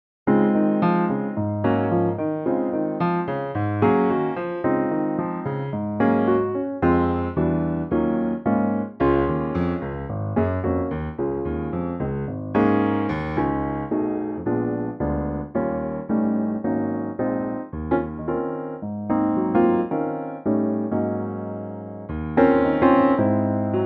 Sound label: Db piano 220 bpm; key - Db - vocal range - Ab to Db; Bright 220bpm piano only arrangement of this classic American standard